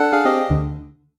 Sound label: game lost